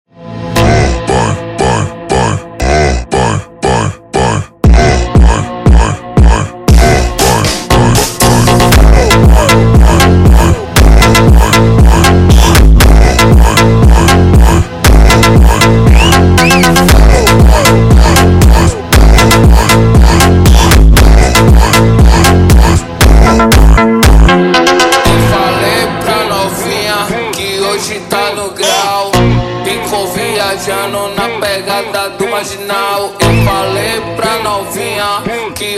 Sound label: Funk slowed